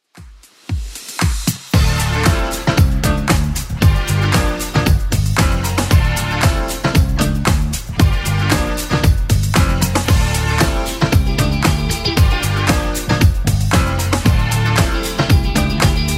80s Club Edit 115bpm

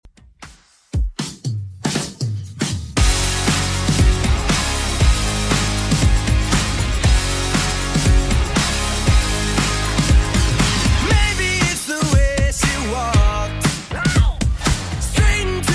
2ks Redrum 118bpm

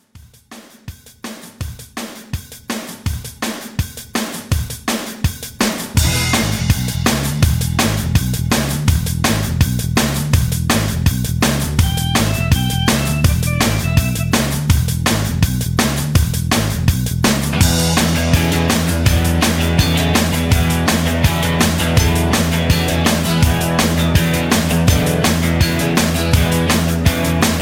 80s Rock Re Drum 165

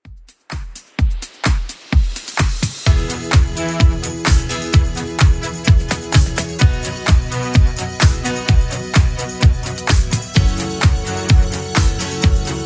80s Club 128 bpm CLEAN

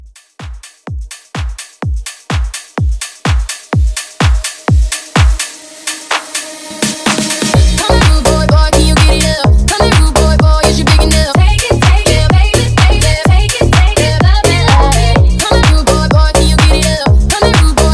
House Mixshow, 126

